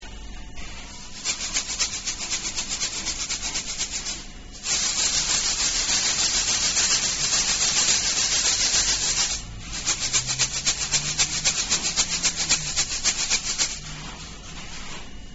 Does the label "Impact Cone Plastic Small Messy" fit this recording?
No